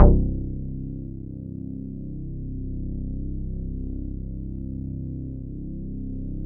bass, synths

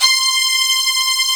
C 4-VI S.H, VIOLA SECT; AKAI S6000 CD-ROM - Volume 1; Strings

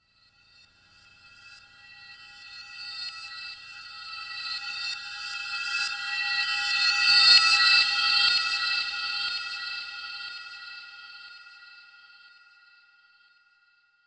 Effected Cymbal; SFX & One-Shots

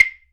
Clave, EMU PX-7 Drum Machines

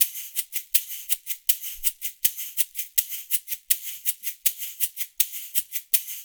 LOOP, SHAKERS, Percussion

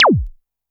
korg trident

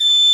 A5 PICCOLO; Northstar; New Gold VOL-2

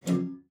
PLOK E2, spinet; Xsample VOL-7

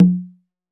L CONGA OP; AKAI S6000 CD-ROM - Volume 5; CONGA 2

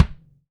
AMB KICK; ST AMB KICK

